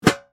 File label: Cooking pot lid close; utensil